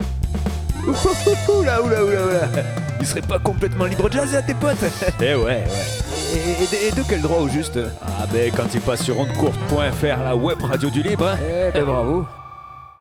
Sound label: jingle Jazz